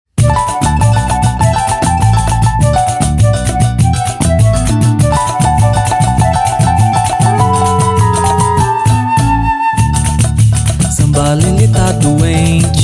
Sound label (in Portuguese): SAMBA LELE